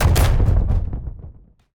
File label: Cast Drum